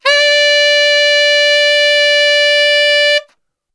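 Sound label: D 2X SAX STR, saxophone; Best Service; Brass Super Section; SAX STRGHT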